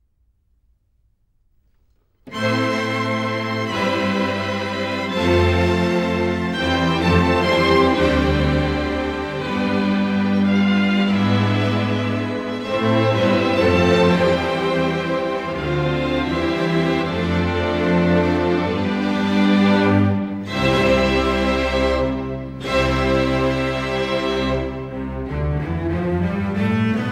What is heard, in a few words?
String Serenade